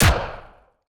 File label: Action Gun Shot